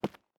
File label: Footstep Concrete Walking; gamerip; The Looker